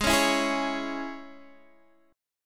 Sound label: Db Minor Ab